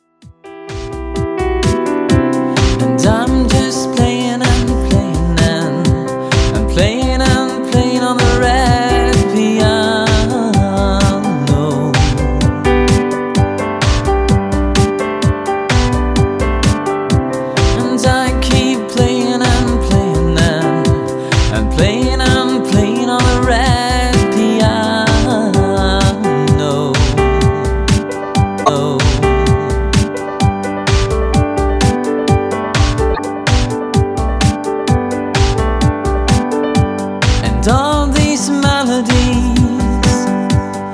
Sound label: The red piano